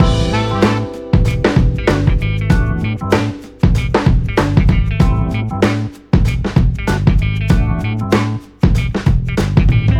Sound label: Jazz